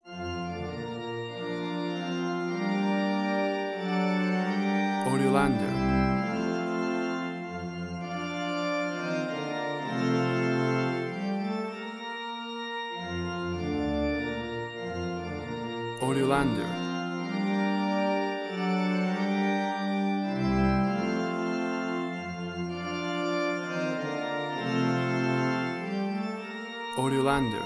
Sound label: American National Anthem Church Organ